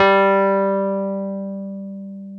PNO, Roland Keys of the 60s and 70s, Wurly

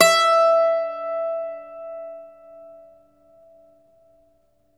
IRISH BAZOUKI, Plucked Strings; E-MU Producer Series Vol. 7 – Old World Instruments

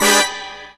BRASS SHOT; E-MU Formula 4000 Series Vol. 5; Protozoa; Phatt Instrmt

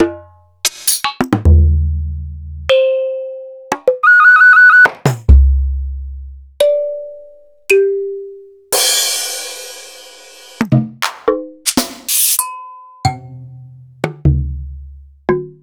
EMU Planet Earth

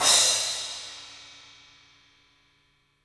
Studio Cymbals

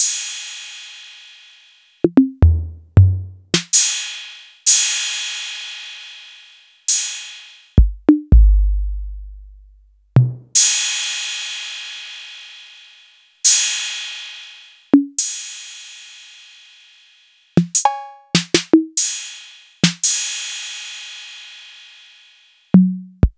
TR-808; Sliced

